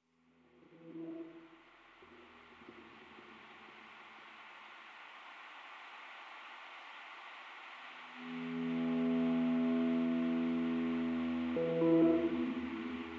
Guitars

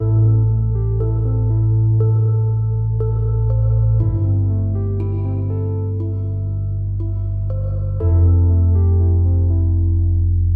Rhodes

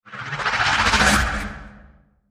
Speeder Bike Flyby

